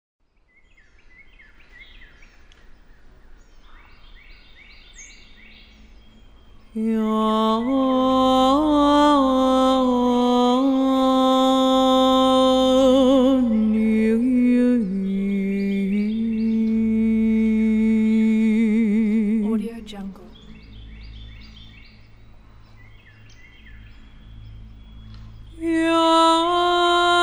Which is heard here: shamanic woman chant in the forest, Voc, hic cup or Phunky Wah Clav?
shamanic woman chant in the forest